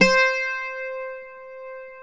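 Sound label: Bouzouki C4 right; AKAI S6000 CD-ROM - WorldSounds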